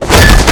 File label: bat impact